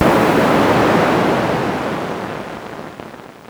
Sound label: mine hit; seawolf